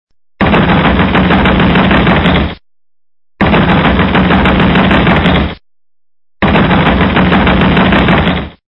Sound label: Door Pounding